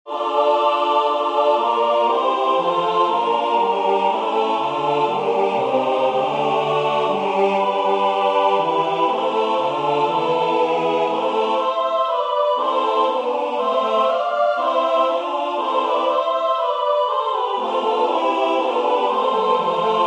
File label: tutti; 7Paroles; Praeter